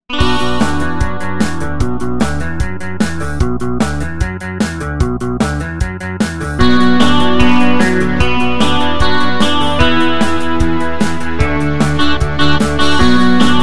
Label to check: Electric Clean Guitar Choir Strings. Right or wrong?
Right